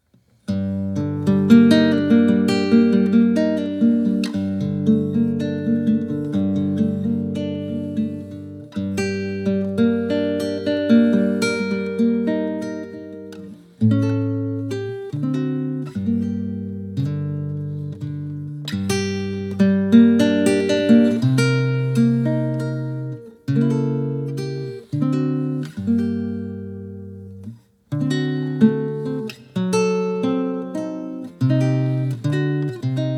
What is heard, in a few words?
Piano Solo